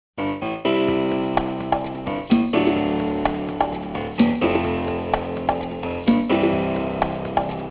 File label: ringer; Ringtones